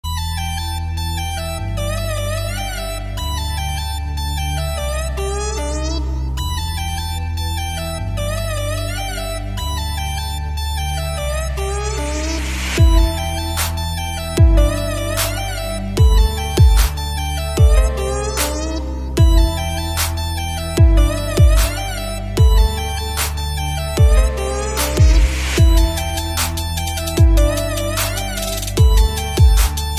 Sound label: Nokia Remix; Nokia Escape